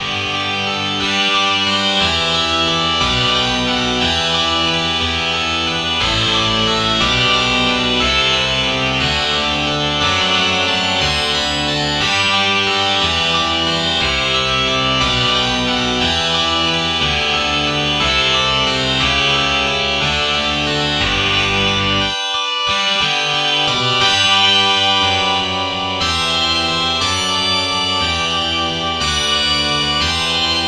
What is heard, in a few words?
distortion guitar